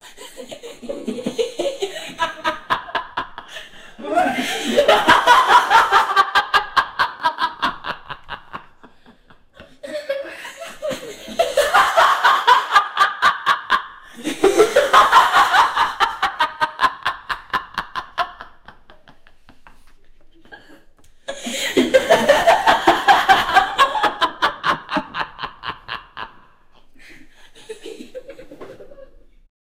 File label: maniacal laughter of fema, asylum